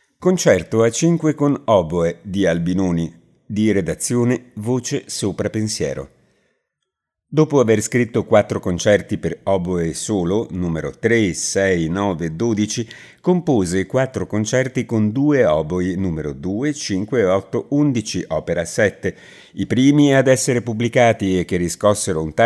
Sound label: Concerto Oboe; Albinoni; concerto a cinque con oboe